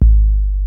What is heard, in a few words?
kick; E-MU Formula 4000 Series Vol. 1; Hip Hop Nation; Bonus Perk